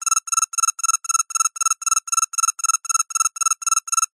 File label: science fiction computer alarm beeps warning digital; zapsplat